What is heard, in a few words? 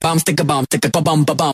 bom sticka bom stick bom bom de bom; ooh ahh